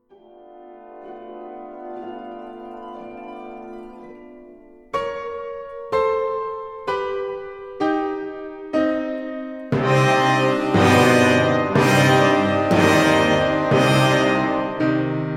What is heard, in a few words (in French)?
concertos pour piano, moderato sostenuto; 4.zara levina; Concerto pour piano et orchestre N°2 de Zara Levina